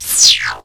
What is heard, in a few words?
PERC FX